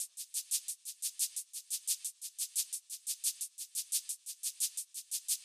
Synth (Shaker layer)

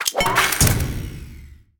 Juice Squeezer Build; Kiosk

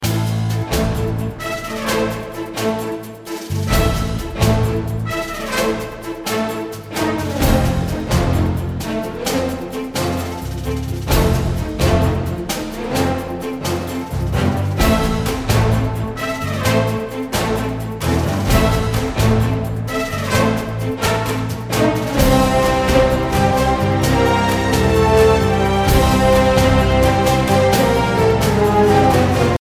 Music Menu; Strikeforce Kitty

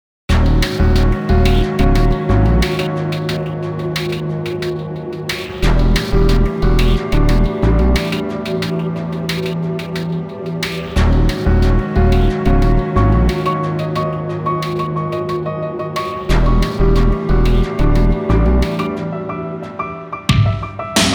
Unsettling Atmosphere; Pokemon Sun Moon Super Music Collection

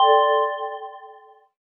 MESSAGE

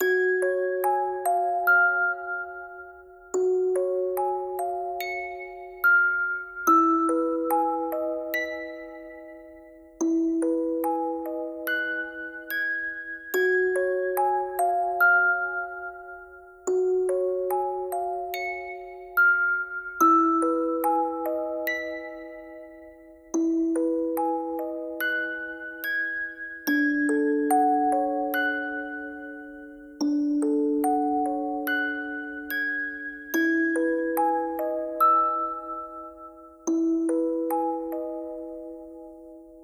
Music Box

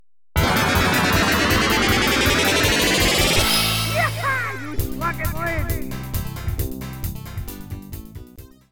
Banjo Bonus Collected, pinball; Banjo Bonus Collected & Jack's Pot Collected; Cactus Jack's; Gottlieb; arcade

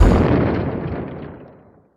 Ruins Jaw Hit

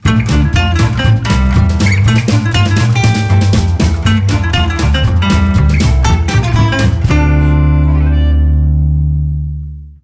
Match Over; Glass Joe

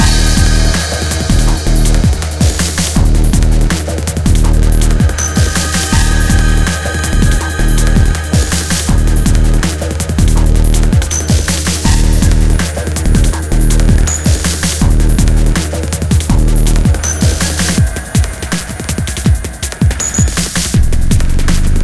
Combat; mirror's edge gamerip

